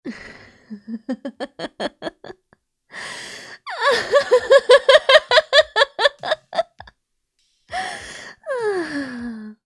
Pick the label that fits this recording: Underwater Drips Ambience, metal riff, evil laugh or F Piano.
evil laugh